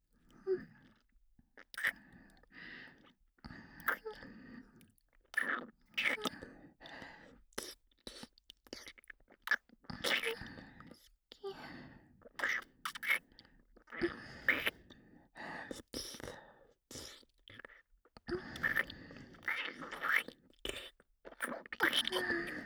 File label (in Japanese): キス-ループ